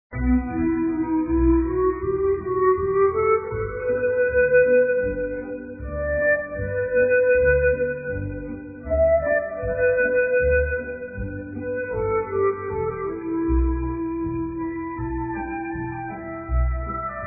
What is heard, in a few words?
music inca